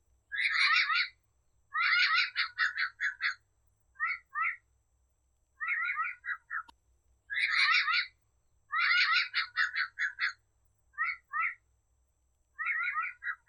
Canto do Papagaio de nuca amarela

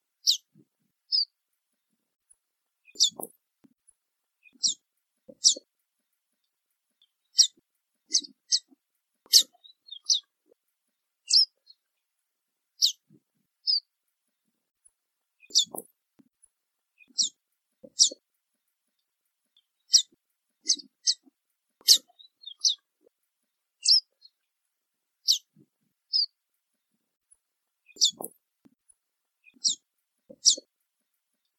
Canto do diamante modesto

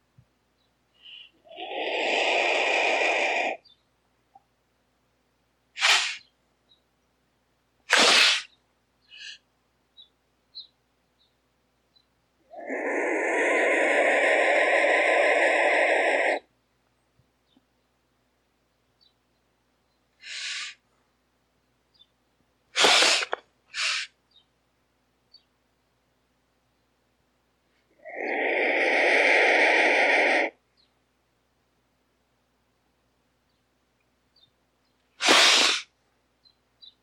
Canto do Urubu de Cabeca Preta

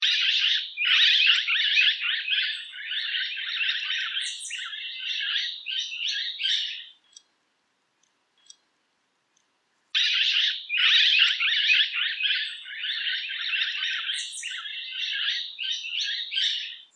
Canto do Periquito catarina

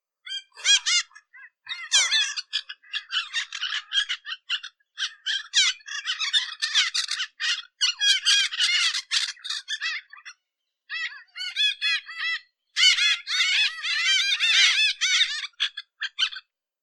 Canto de Periquito moustache

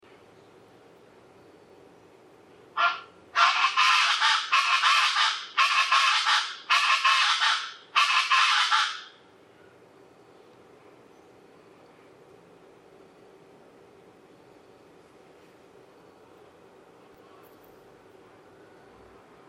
Canto de Aracua do pantanal

